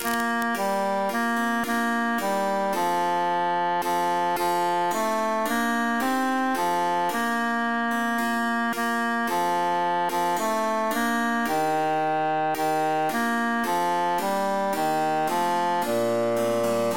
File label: bassus emphasized; es ist ein schnee